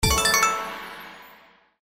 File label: REEL SYMBOL HIGH PAYING, SLOTS; Magic Coin